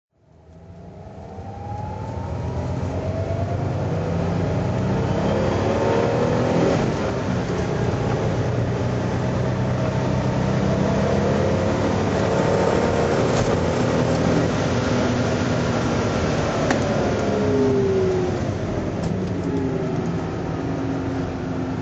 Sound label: porsche 911 turbo drive